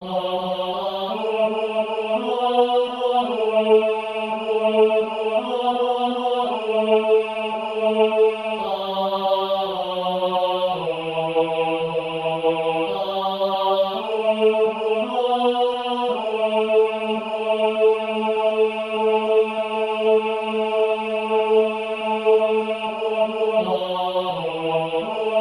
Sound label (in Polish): Tenor solo